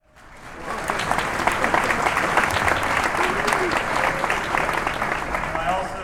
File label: Applause